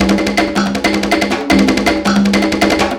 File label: Conga Groove, Percussion Loops